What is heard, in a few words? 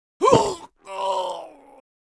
Mob die; Bossmob; 욱일승천류; 귀장 아베